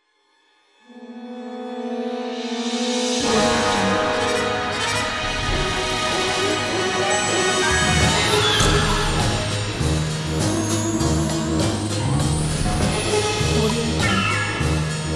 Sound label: march of the buffoon